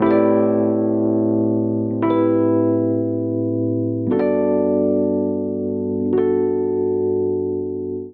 Vamp Neo Soul Uplifting i - VII A Minor 118 bpm, Electric Keyboards; Ableton; Factory Packs